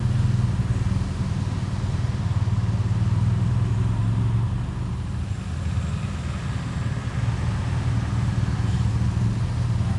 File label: Ambience Menu Loop; Subway Surfers City Sound Effects; Android